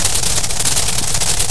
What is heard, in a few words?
engine; Quantum Redshift; jaya